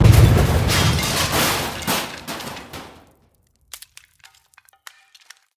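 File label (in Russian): громкий одиночный взрыв с разлетающимися осколками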